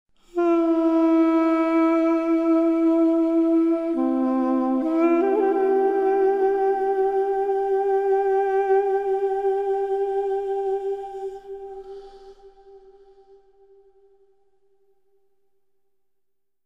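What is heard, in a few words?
theme melody; Myst III Exile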